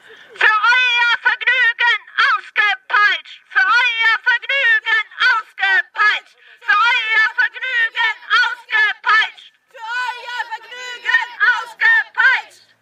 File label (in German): demonstration gegen zirkustiere; zirkus probst